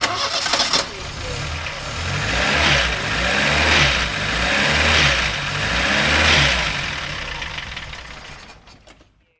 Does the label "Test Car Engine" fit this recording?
Yes